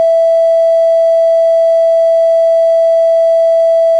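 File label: Aircrafts Cockpits Morse Beep; DCS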